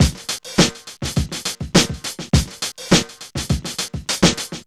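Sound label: 103 bpm, Funky loops; Zero-G; Funky Elements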